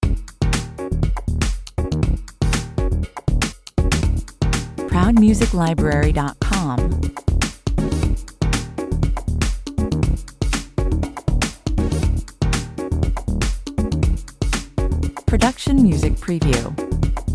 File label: Bounce funk with pianos, electric pianos and bubbly synth effects